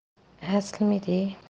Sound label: midi